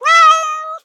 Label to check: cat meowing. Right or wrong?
Right